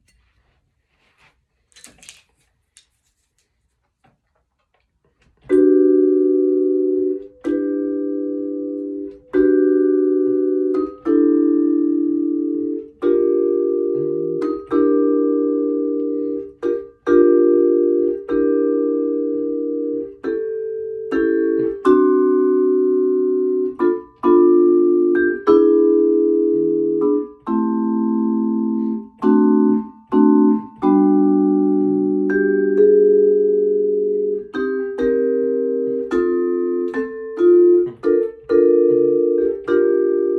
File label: vibes